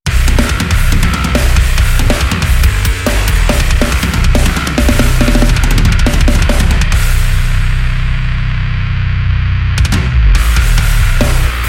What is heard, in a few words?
bass; avedis mutter monolith; polarity audio